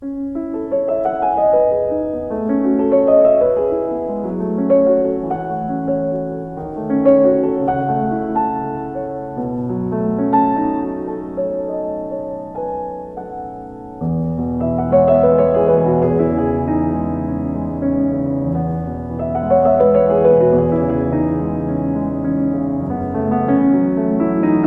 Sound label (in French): sonnerie; debussy arabesque